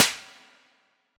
Snare

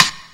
Snare

